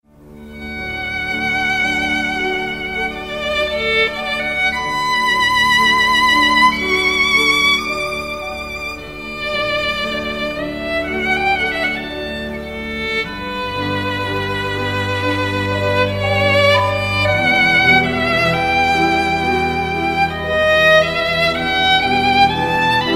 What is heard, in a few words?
Sad Violin Classical